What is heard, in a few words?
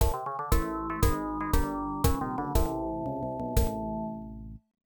Defeat; Renegade; arcade